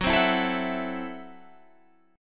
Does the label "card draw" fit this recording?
No